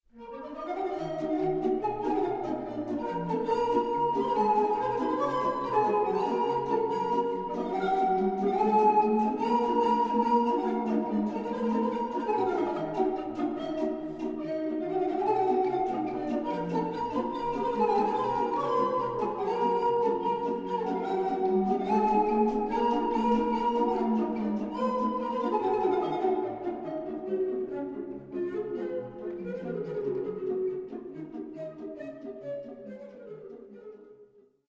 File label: verbunkos fragment